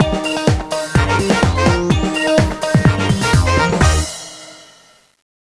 Disco Fin; Imagine Modern Dancer; Nintendo DS

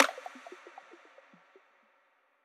Hover Hands Snap; Hover Hands Founder Snap

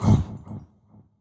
Hurt; Going Under

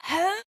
Overhead Wind Up; Jackie

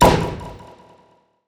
Stamp Comp; Puyo Puyo Puzzle Pop; iOS, macOS, mobile

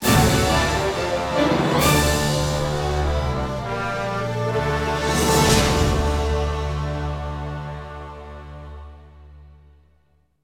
Combat Win; The Hobbit; PS2

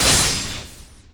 Enemy Weakpoint Hit; disney star smash android ios mobile gamerip 2020

